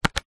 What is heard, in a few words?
office calculator button press single; zapsplat